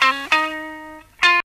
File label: guitars three notes